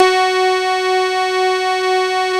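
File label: synth, MicroWave; Sound & Vision - Gigapack I CD 2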